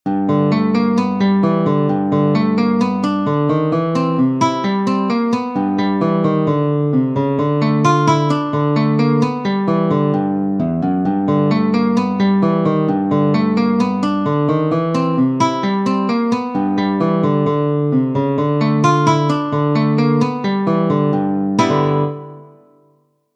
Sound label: blues